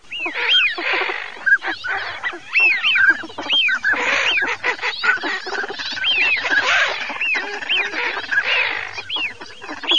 FISCHIONE CODO

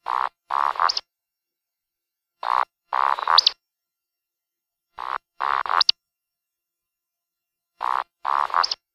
beccaccia, birdsound

